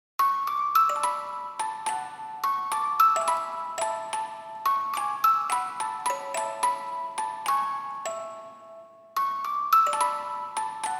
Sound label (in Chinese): 八音盒铃声